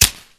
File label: flick